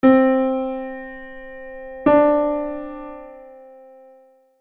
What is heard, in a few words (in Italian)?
tono